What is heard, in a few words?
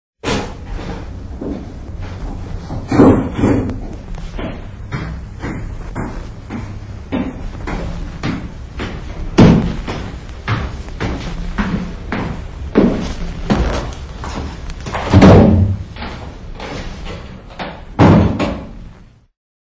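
steps on stairs entering door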